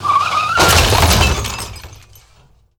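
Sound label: Hogrider Skid Underlay Death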